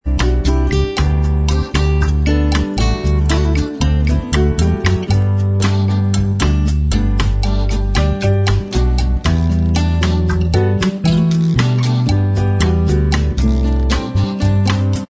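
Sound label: bossa mix instrumental track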